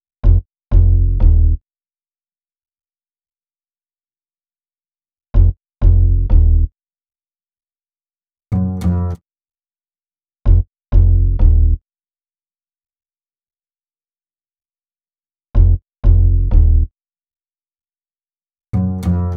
Bass, Hip-Hop

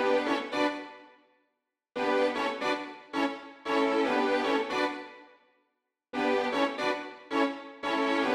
Strings, 115bpm; Zero-G; Nu Disco; Construction Kits

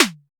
CHZ SN HARD; Drums In Your FACE!; CHEEZEKIT

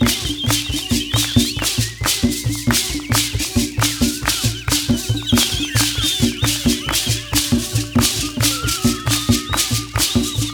RITUAL WHIS, RITUAL DNC; Spectrasonics; Heart of Africa 2

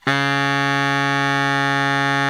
baritone FF C#2, Sax; Giga Samples Collection

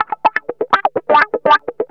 GUITARS 125, House

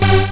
Stab

